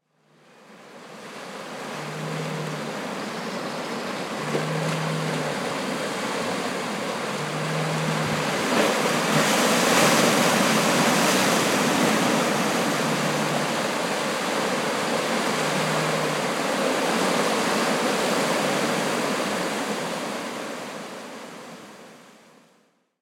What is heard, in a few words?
whistle buoy